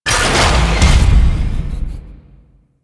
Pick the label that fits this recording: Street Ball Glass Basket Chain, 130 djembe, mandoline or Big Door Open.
Big Door Open